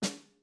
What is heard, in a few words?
snare mp; Membranophones; Struck Membranophones; Legacy Snares